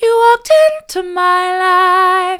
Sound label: soft, vocal